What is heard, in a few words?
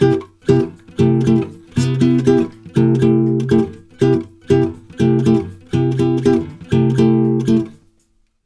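120 guitar C, brazil bossa; USB Soundscan; AKAI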